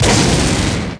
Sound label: Heli Explode; ASUS; P4B533; Intel 845E ATX